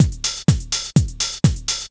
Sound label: drumloops 125; house; PS-28 Vocal House Samples; livezone